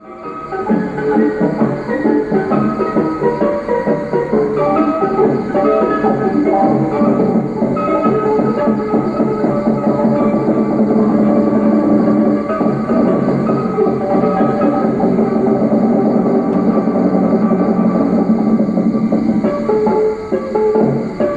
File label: Weeding s music in Cambodia